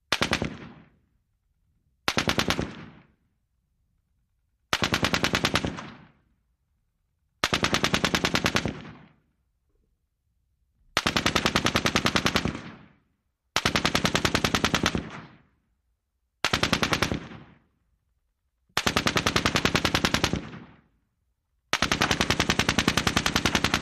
M60 Mediumlong Burst

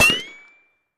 RICOCHET; riccos

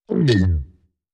Gigantic Monster G

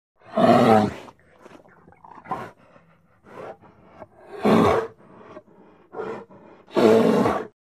Bear Grizzly Growls

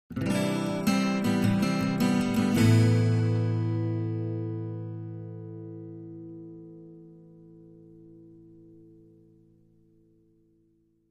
Acoustic Guitar Positiv

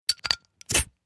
Beer Bottle Opn Opner

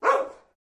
Doberman Dog Bark Ind

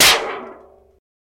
Aluminum Siding Impact, Warble

